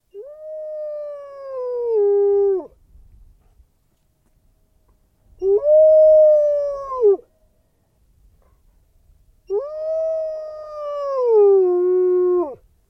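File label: sounds with dog howling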